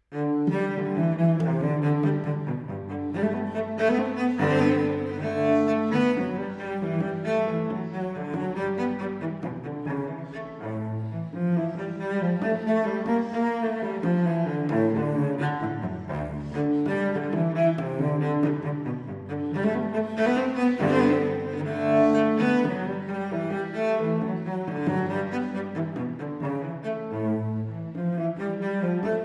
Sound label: Bach G Gigue